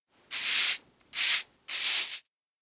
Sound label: room clean broom; anemoi